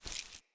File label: Grunt Foley Fill; Enemy; Characters; Corrupted Sproutfolk